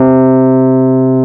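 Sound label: PIANO C2 f 73, Rhodes, 73 Suitcase; Keyboards of The 60's and 70's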